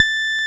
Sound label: Roxichord, E.Piano